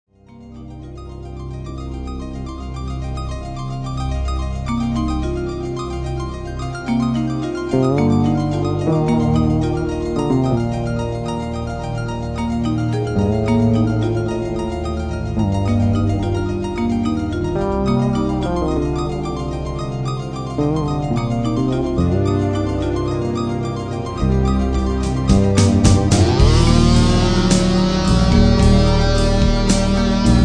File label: rise